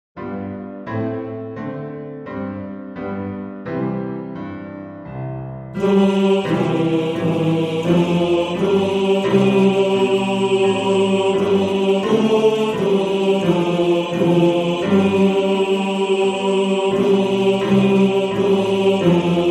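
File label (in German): Bass 1, Männerchor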